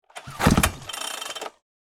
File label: Electric grass trimmer start engine perspective